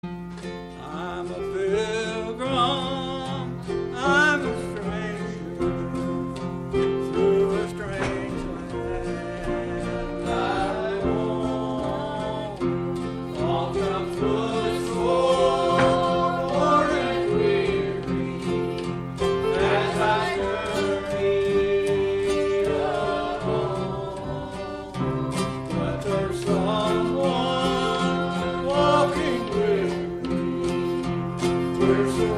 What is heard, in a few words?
Choir; Im Never Alone